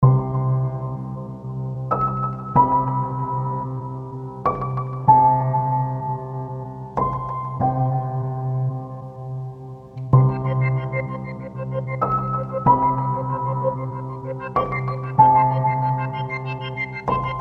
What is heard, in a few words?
Sleepy Sirens; Noisebiscuits